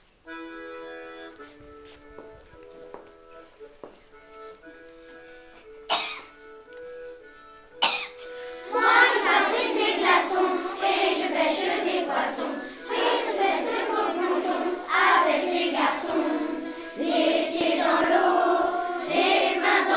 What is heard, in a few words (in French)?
les pieds dans l eau chant